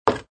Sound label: wood